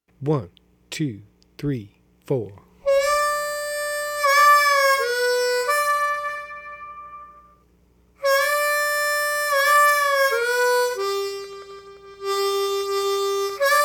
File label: Reubens Train Slow